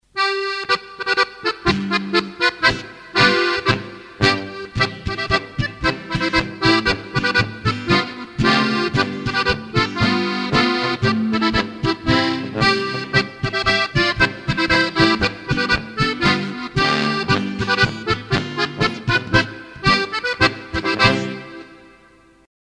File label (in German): Plattler, Marsch; Aschauer